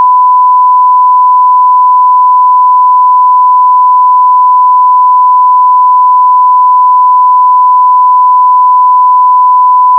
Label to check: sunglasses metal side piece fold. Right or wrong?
Wrong